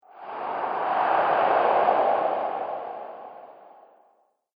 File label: Gruesome Ghost Monster Discharge; Ghost Sound Effect - Sinister, Scary, Spooky Or Just Silly; Haunted House